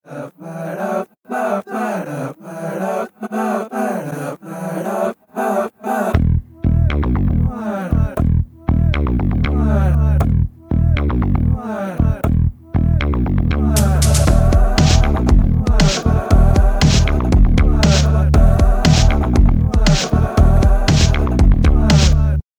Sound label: Bgm Ringtone